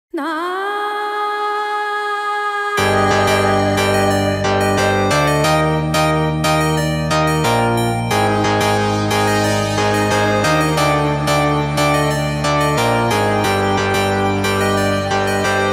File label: FUNK Music